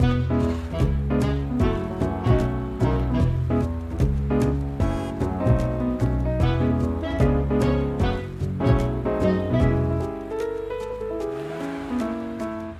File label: background music; Highway B